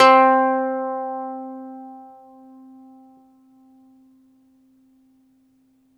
BALALAIKA, Russian Strings

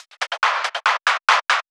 Fills, Loops 140 BPM

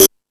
Discoball, Drum Machines E-MU Pro-Cussion

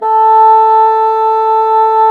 BASSOO, Bassoon; Sound & Vision - Gigapack I CD 2 (Roland); Brass&Woods1

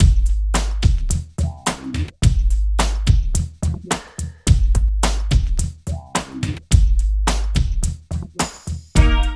DRUM LP; East West - Phat & Phunky CD2; 90's Sample CDs

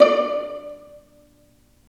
Vas Pizz, String; Roland - String Master Series

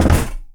Foley lo

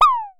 Synare Filter; Star Instruments Synare 3

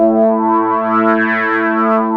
SYN ANA4; Best Service Gigapack 1

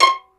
pizzi total, stringed; East West; Global Sounds

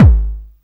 DIST. KICK

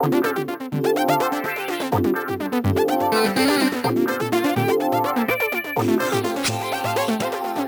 D 125 Dry, MELO + FILTER; RoBKTA Sample Pack Supreme

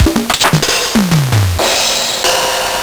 Nintendo Gameboy LSDJ Linndrum

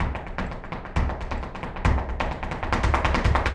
Flamenco Tapping Zapateado Solo

